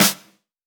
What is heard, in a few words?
Claps & Snares